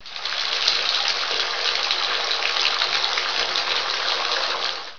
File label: bath; liquidwar